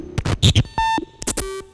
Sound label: Glitch